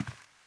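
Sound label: dirt slow walk, footsteps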